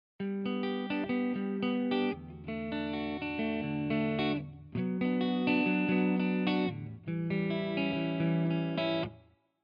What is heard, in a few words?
les paul wet; chiqui audio